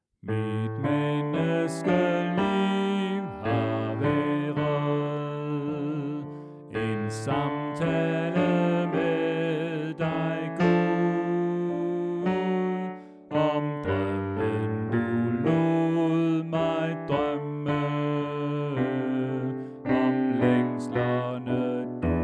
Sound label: Bas; oevefiler